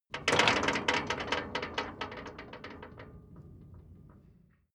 metal gate rattle single

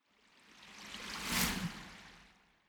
whoosh bright

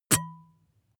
glass bottle drink stop

